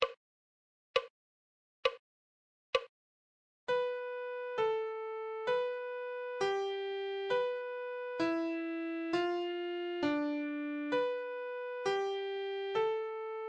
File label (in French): Clé de Sol Adagio